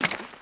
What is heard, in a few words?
Frog Walk; Seer; GamigoNA